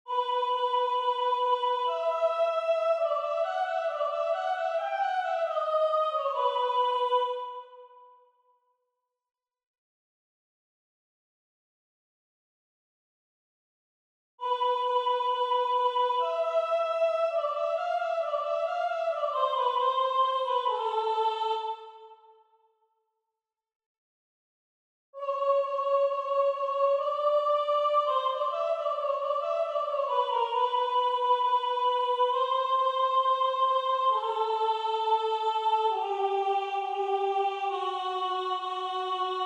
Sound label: Soprano; Antonio Vivaldi; Gloria